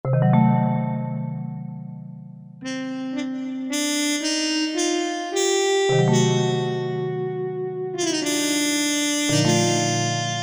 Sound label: Vive-Mute, Korg MSC-1S; Korg MSC-1S Variety